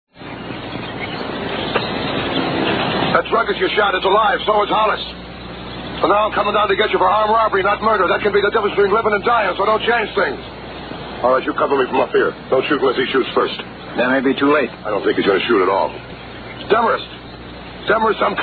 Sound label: copter